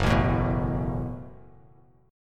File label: Gb Minor Double Flat 5th, Bass Standard; strummed; 2,0,7,4; JGuitar